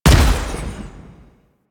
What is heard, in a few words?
Weapon One Shot; Weapons; Modular Weapons; Warframe; Railjack